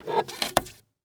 Technology Electronic Machine Wood Record Player Removing Record